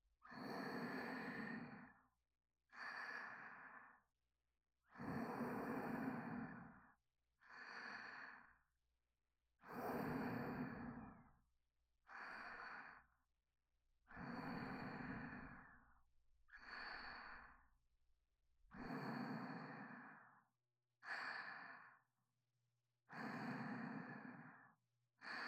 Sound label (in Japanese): おまけボイス 寝息